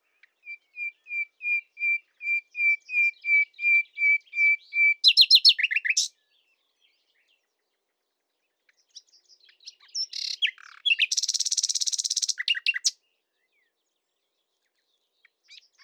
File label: Common Nightingale Luscinia megarhynchos